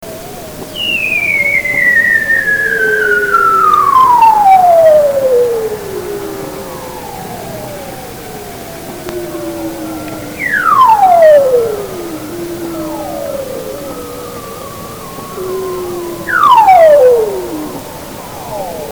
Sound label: 3 bearded loud